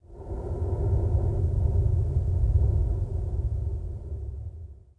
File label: WIND, wind; zones